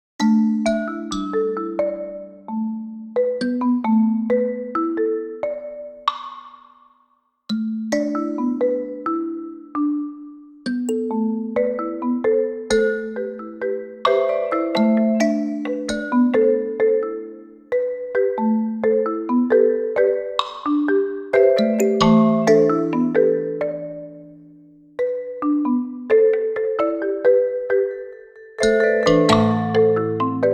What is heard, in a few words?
Marimba, Comic Slow; Barbie Marimba